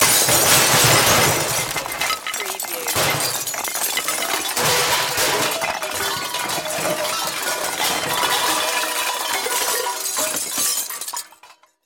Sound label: Long Junk Crash; Action, Impacts & Explosions